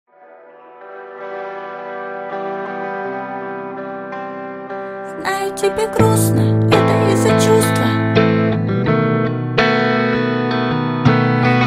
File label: acoustic version